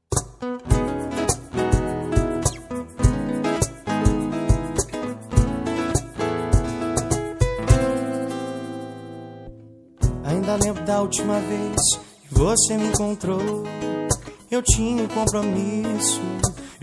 sertanejo romântico

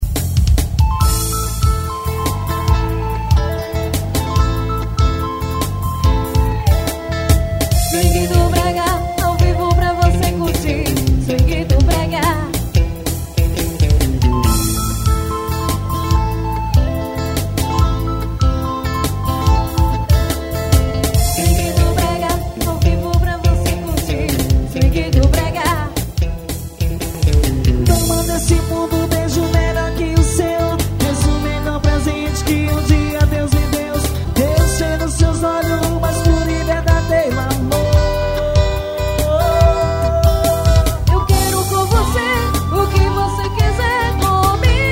swingue do brega ao vivo no bar; bar do neco

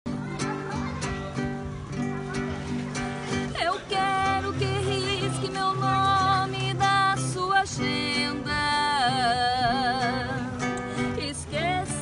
cantando

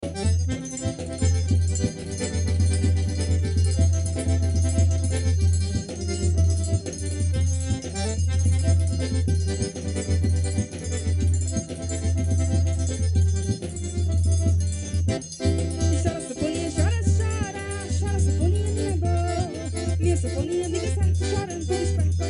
sanfoninha choradeira

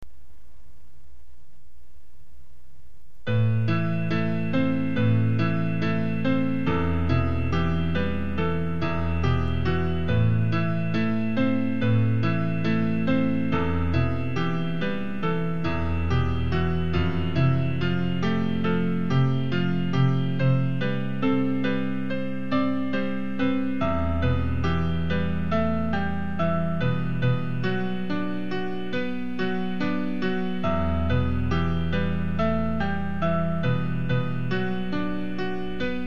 romance com piano

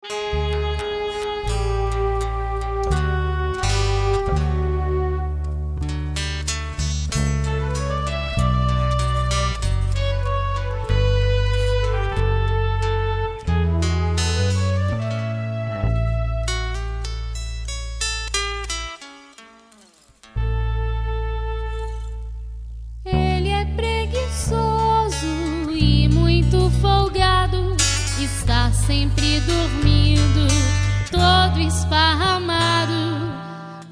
projeto infantil gato gatuno

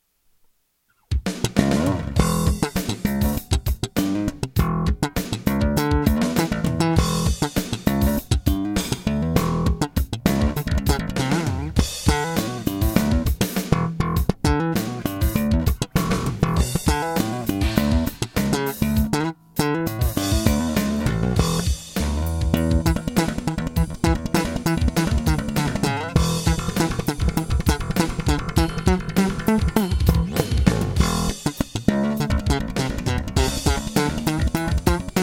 sleep bass violao

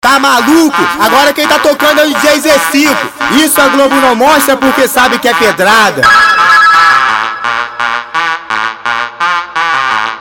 arrocha

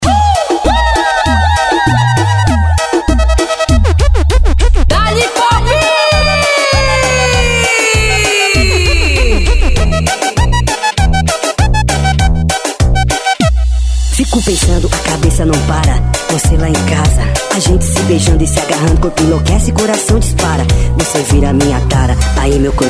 melody

